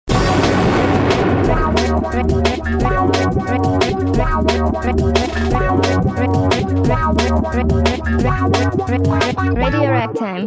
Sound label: drum fast, jingle